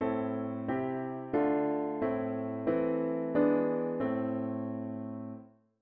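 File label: diatonic inverted V7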